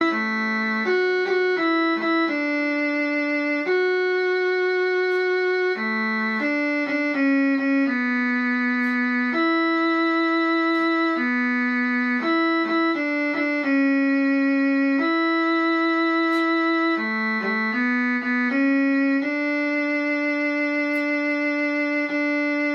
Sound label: Violin; weddings